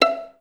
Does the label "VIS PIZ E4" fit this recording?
Yes